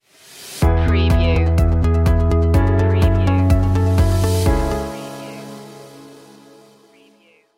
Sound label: steady progress stinger